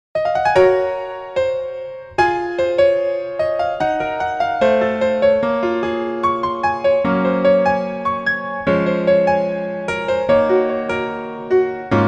Piano ver, 멜로디MR, -1키

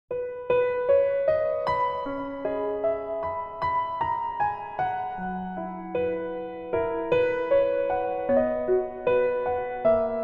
Piano ver, 멜로디MR

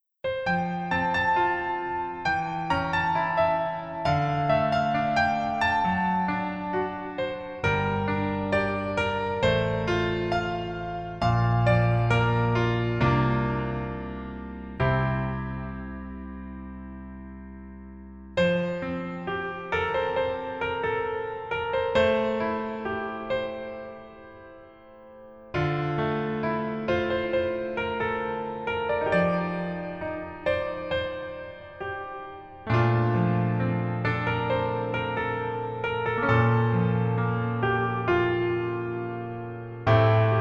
Piano ver 멜로디MR -1키; 그 남자의 기억법

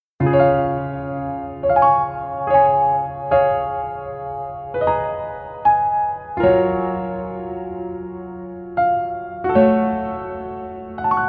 Piano ver 멜로디MR -1키

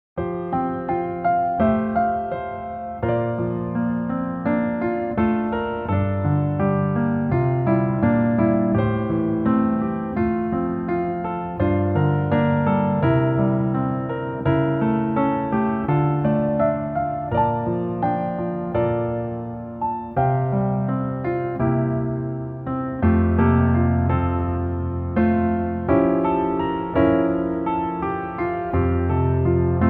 Piano ver 멜로디MR 여자키

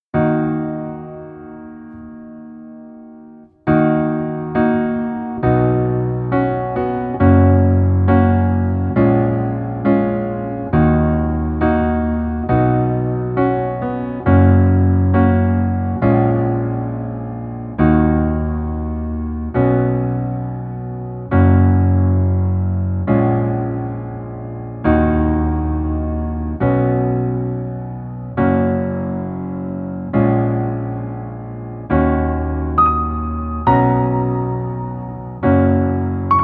Piano ver 여자키

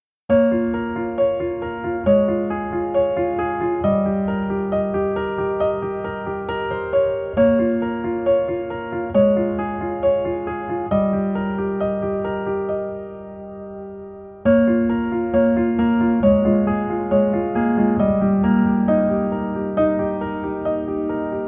Piano ver 멜로디MR

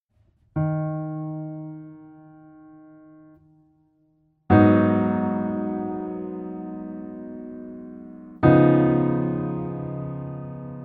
Piano ver, -1키

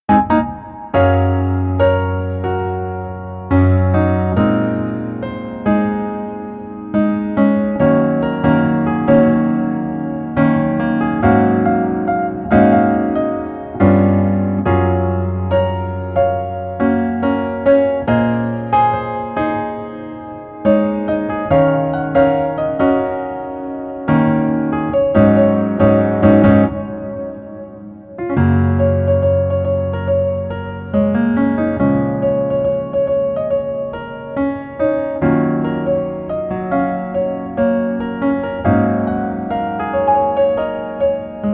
Piano ver 멜로디MR -1키

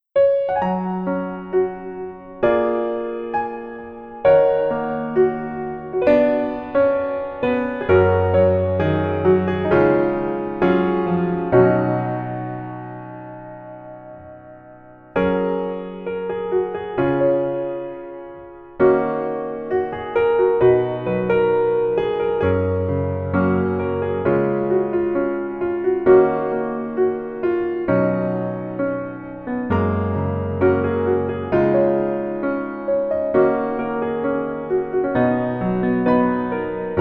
Piano ver 멜로디MR

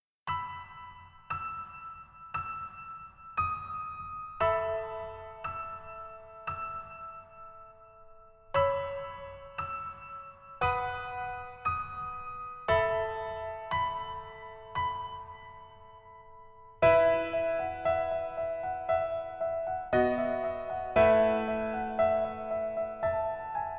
Piano ver 멜로디MR

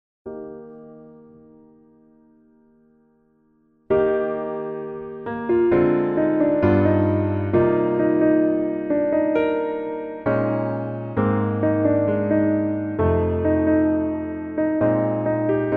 Piano MR 멜로디 MR -1 키

